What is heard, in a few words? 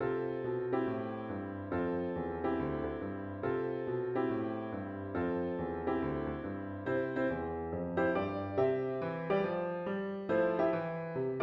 lune jazz